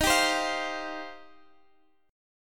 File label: D sharp 6th Add 9th